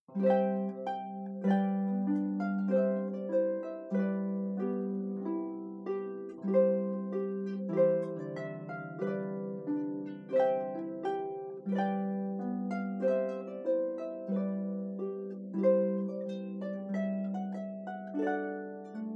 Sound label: Trumpet Tune